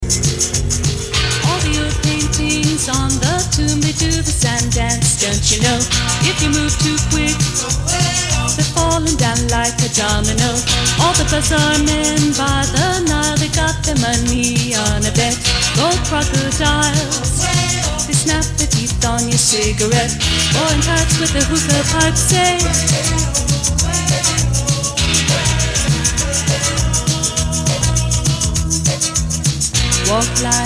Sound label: Rock & Pop